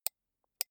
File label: computer mouse right click slow soft